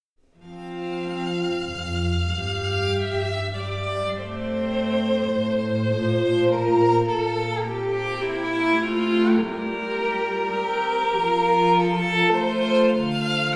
Concerto for Two Violins Strings and Continuo in D